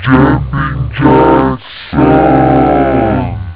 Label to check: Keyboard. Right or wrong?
Wrong